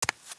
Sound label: Easter Chicken Walk